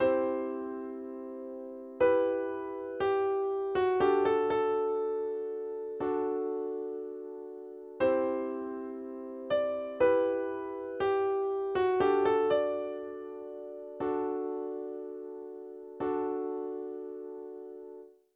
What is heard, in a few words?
instrumental D